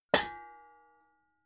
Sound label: pots hit hard